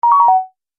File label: Multimedia Event Notification, beep sound effect